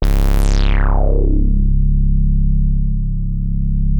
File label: C#2, Bass; E-MU Producer Series Vol. 6 – Analogia Project (CD 1); Boom da Bass 2